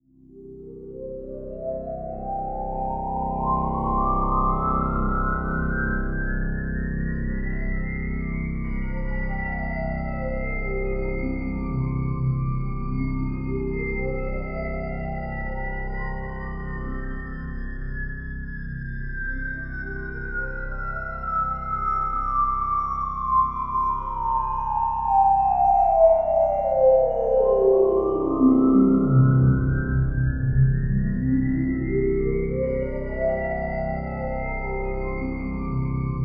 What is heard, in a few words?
RESO DRONE; Zero G; Malice In Wonderland